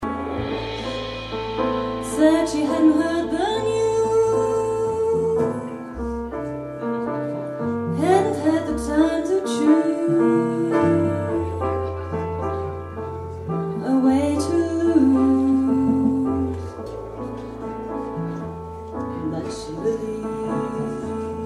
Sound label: quintett pop, live